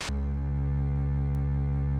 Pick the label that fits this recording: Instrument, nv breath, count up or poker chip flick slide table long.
Instrument